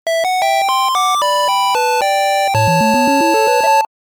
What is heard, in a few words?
8bit; asanomezame